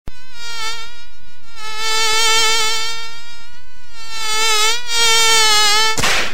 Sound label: mosquito bap